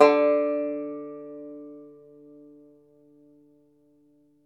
FLAT TOP, Flat Top Banjo

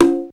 OP CONGA, HR-16; Hollywood Edge; The Big Bad Beatmachine; HR-16B

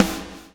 BLOW; Spectrasonics - Backbeat

